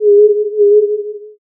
owl

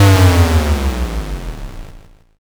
explosion

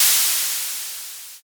steam

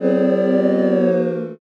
commotion

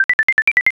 processing

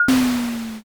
auto door

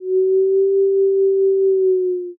erhu

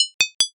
armor

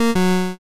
cant buy

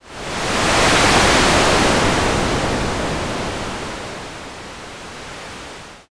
wind

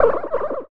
slime split

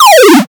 powerdown

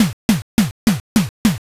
footstep run

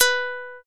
shamisen

